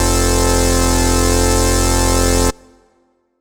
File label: lead maja F; leads